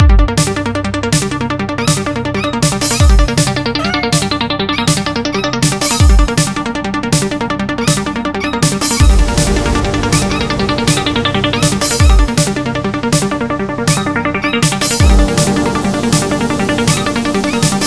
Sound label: MENU no voice; MEET THE SPACECATS version